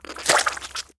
small, slime

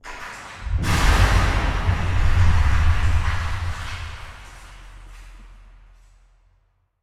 cave, ambient; HunterZ G4; minecraft

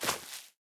grass, dig; HunterZ G4; minecraft

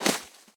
snow, step

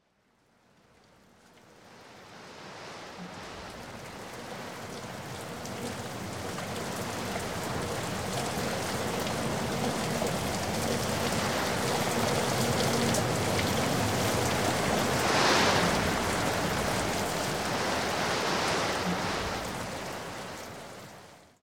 windmill wind loop; matmos hl

